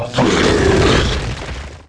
attack

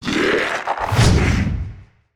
attack; AshesButcher

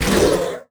Assassin hit; ScorchedCanyon

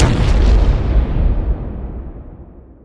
hand attack; maya

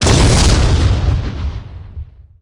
Bursting flare; GunCrasher

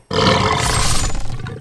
attack

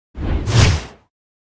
attack; beastwoo

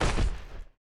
Raised Gladiator move

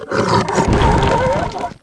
death

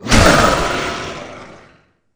General Death; Ferea

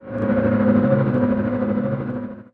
attack

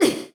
Storm Fiend Hit; TormentaIsland

